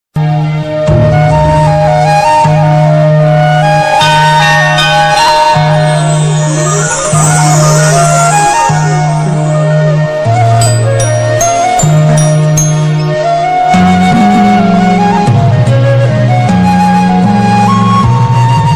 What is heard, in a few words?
bgm phone ringtone song